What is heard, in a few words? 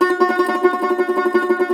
cumbus saz F#, plucked strings; E-MU Producer Series Vol. 7 – Old World Instruments